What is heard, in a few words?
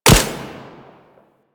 Shield Speeder Rifle Fire